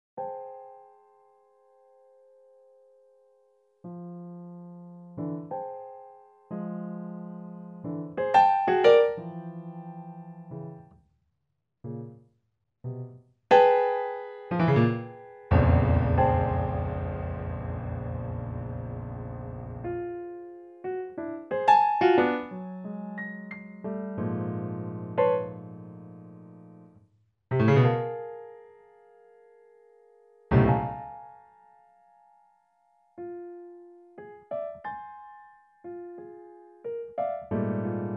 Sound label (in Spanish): piezas para piano; 5 piezas para piano